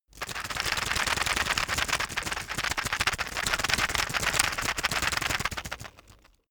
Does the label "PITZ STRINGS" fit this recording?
No